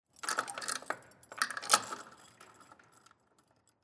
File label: Chains